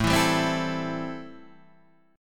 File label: C 6th A, chord